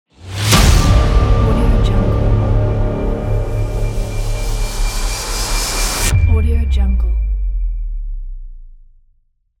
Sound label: logo intro